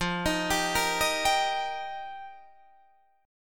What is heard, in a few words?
F 7th Suspended 2nd Suspended 4th, Guitar Drop D